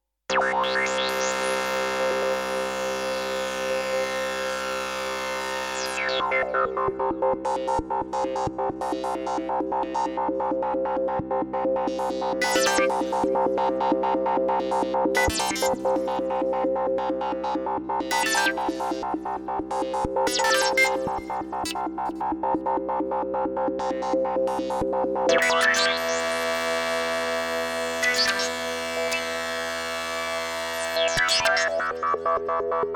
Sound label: lightwave pad fx; plugiator